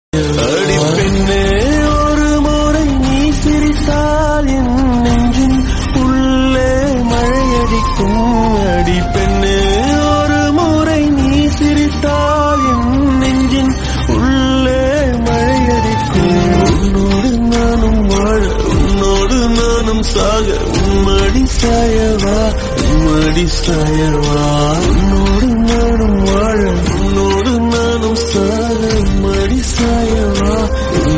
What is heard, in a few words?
tamil song, bgm ringtone